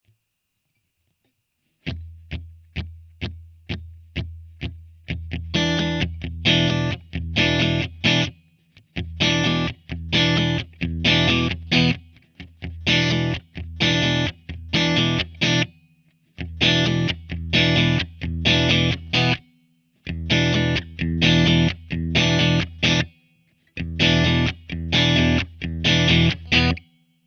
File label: E min Gtr